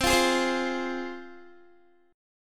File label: C Suspended 4th Sharp 5th, C, down, Guitar